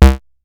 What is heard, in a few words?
bass stabs, Drum Machines FutureRetro FR777